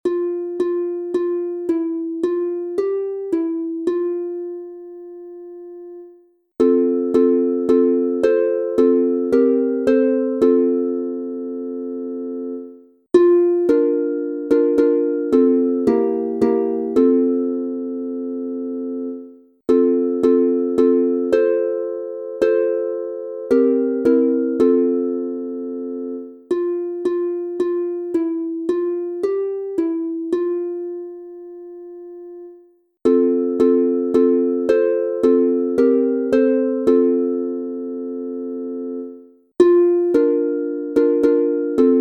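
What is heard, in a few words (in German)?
F Dur 3 stimmig, Harfe; In Nacht und Dunkel liegt die Erd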